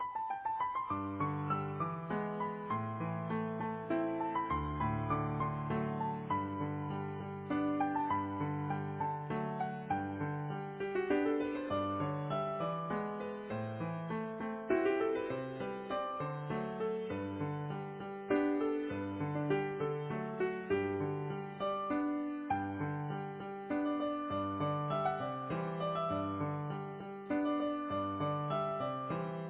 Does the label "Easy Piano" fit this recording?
Yes